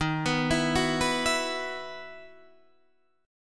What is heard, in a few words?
E Major 7th Suspended 2nd Eb